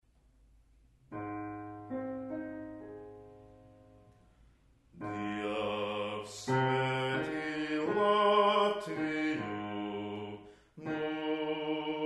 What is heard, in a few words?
bass; Dievs, svētī Latviju; Dziesmu svētki 2013